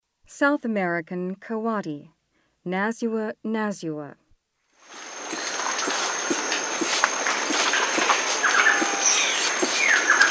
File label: South American Coati